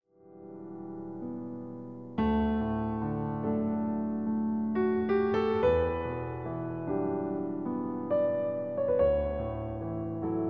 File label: piano; barre